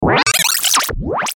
Stinger Reverse Warble; Production Element Imaging Element Accent Transition

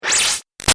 Shot Laser High Mutate; Sound Design; Lasers

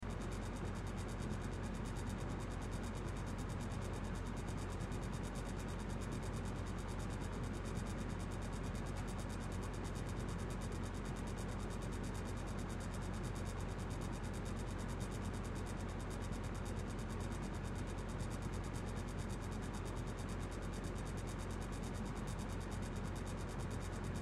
Ceiling Fan Low, Ceiling Fan Low Loop; Fans; Electronics; Household; Blastwave

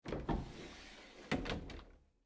Foley Door Closet Open; Closet Doors; SFX Bible 6

